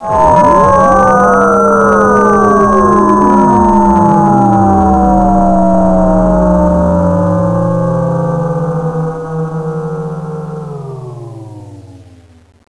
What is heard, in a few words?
FORCEFLD